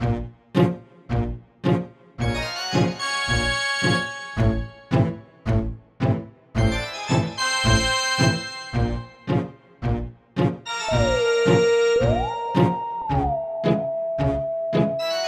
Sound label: Halloween Theme